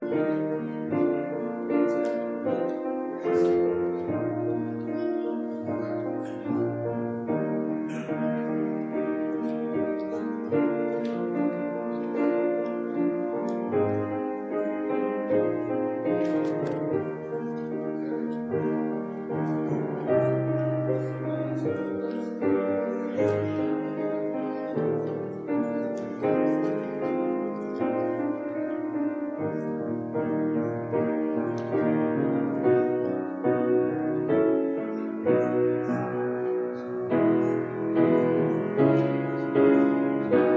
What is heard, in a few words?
preservice, instrumentalists